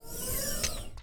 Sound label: door ext open